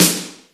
Snaredrum, Roland D-70; Drum Samples

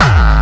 HardCore Kick

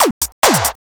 hit clap

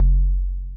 kicks, Bass Drum

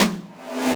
Yamaha RM 50 Toms

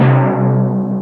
Tom, Yamaha TG-33; Drum Samples

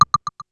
Blocks, Korg T3; Drum Samples

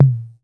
Tom L, Korg KPR-77; Drum Samples

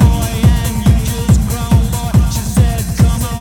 boombass, audioloops

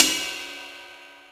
CYMBAL, Yamaha RM 50

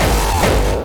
Hard Kick

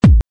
kick

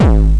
bombo, kicks, Hardcore

